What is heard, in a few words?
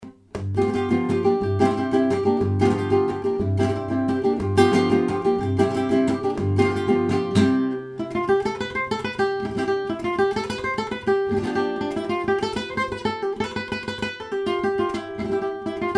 tanguillo, flamenco